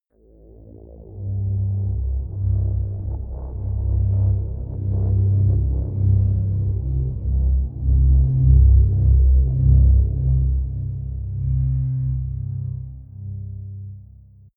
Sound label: atmospheric feedback pad drone; musikelemente; drones